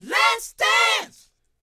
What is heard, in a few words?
A#C, Vocal, DANCE 120; ILIO; Vocal Planet VOL-4; R&B & Dance